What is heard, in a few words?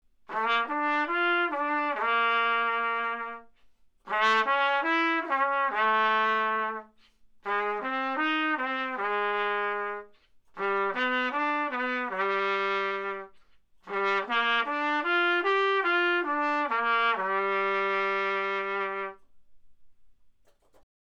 Trumpet